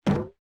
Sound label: crate bounce; crash bandicoot